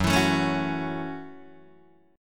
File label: F sharp Minor 7th F sharp, Guitar Open D